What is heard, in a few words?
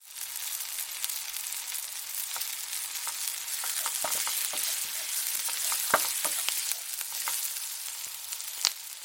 food frying pepper and onions pan stir with wooden spoon; zapsplat